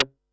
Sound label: Perc, Percussion and FX; Pearl SC-40